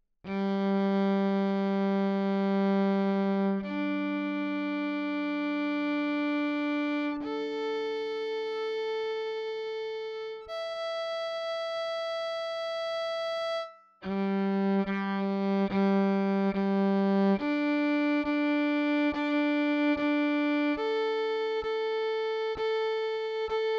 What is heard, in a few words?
Vln Pickup w Redeye Single Treble full on